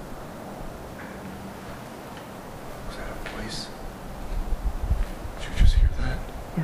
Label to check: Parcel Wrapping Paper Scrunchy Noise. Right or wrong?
Wrong